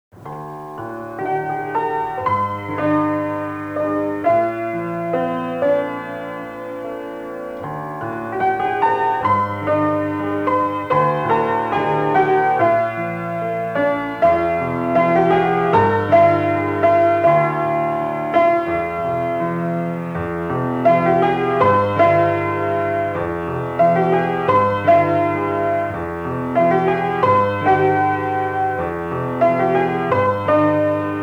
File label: Piano